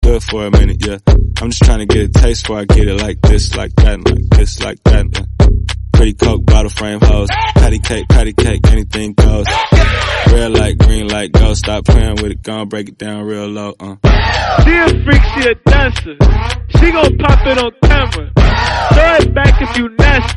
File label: english song